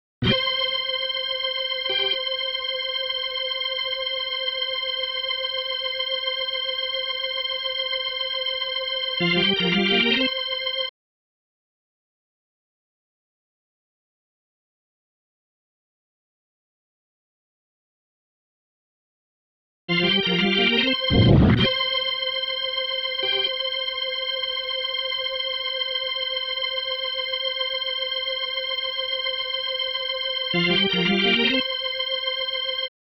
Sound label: organ